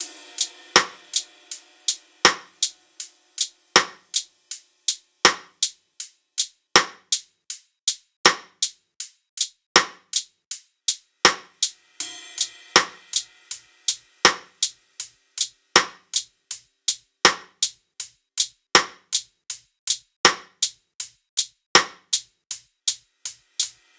tops 80, Top Loops; Drum Sample Pack; SD SEISMIC; Slate

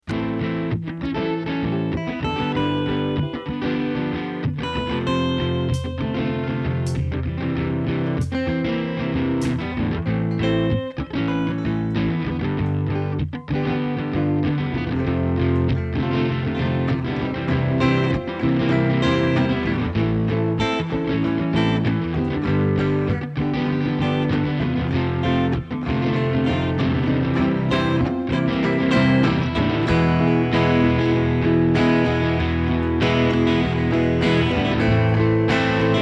Expensive Drum Samples Mix

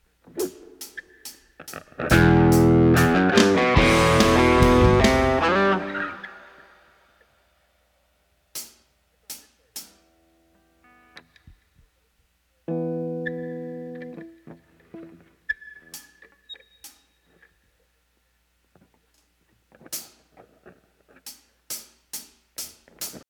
Expensive Drum Samples Mix

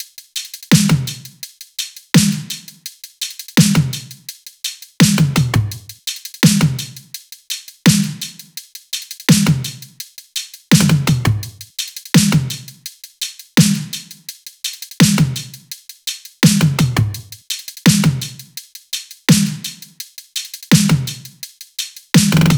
top 84, Drum Loops, Top Loops; SD MIDNIGHT Sample Pack; Slate

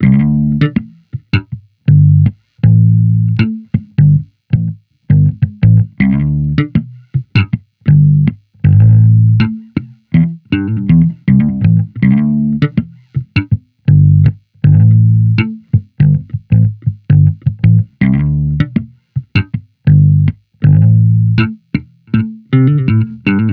Amped loop 80 Am, Electric Bass Loops; Slate Digital BASSHOLE Sample Pack

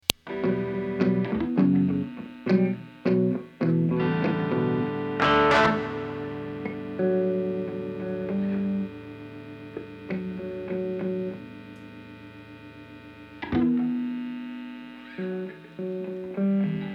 Ambient Guitar Mix

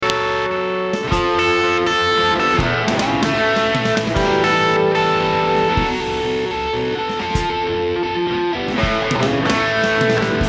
Ambient Guitar Mix

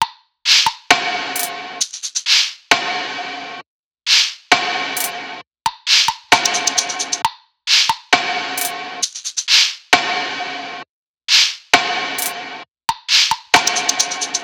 tops 133, Drum Sample Pack, Loops, Top Loops; SD SEISMIC; Slate

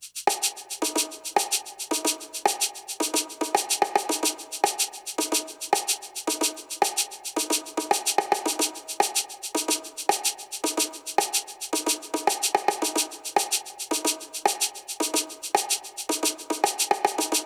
perc 110, Drum Loops, Top Loops; SD MIDNIGHT Sample Pack; Slate

